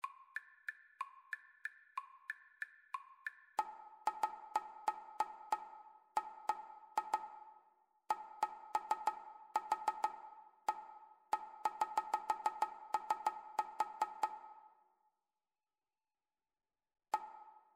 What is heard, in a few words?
rhythmic dictation; ear training